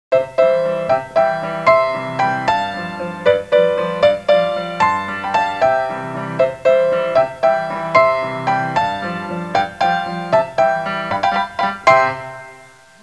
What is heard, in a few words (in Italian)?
suonerie classica; musica classical